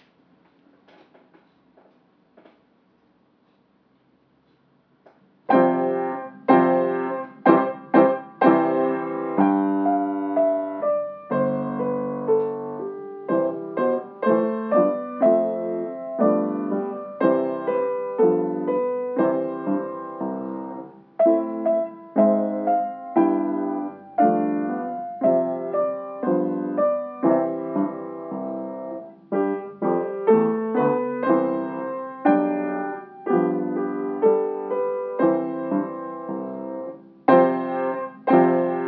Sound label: Happy little pumpkin duet